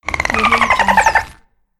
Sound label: chirping dolphin